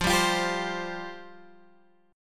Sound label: F Suspended 2nd F down Guitar Open G